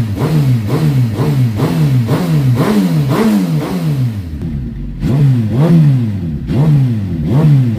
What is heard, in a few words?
motorcycle, engine roars